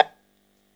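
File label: EL BZ PIZZ G, bouzouki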